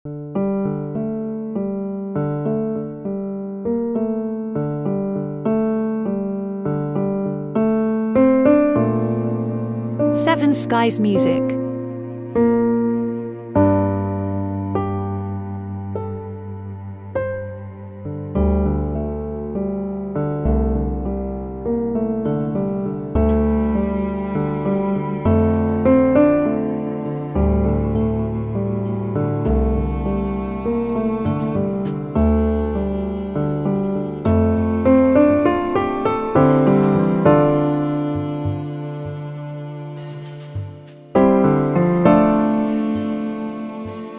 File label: jazz - sad